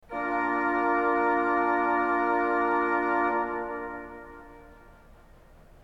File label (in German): ton, orgel